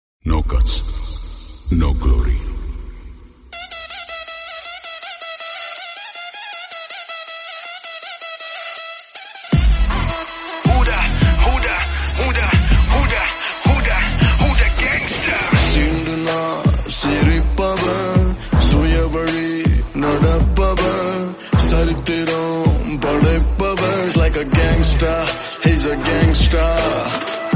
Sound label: Title Bgm; Thunivu